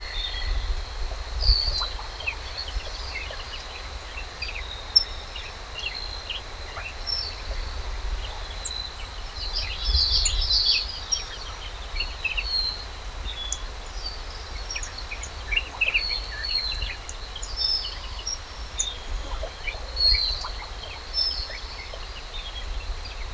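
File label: by a swampy forest lake; u zabolochennongo lesnogo ozera; zvuki prirodi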